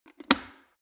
plastic water boiler close lid fast

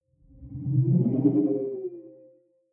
sci-fi display transmission damped

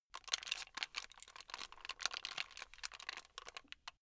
plastic rattle slow

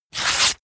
sheet clean tear up fast

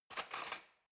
cutlery knife drawer grab hard

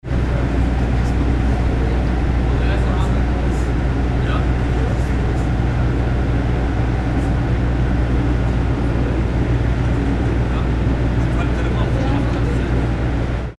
boat barge driving noise slow

